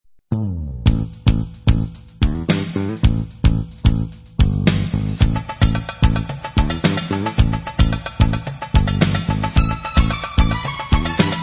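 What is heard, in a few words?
Instrumental, Israeli Dances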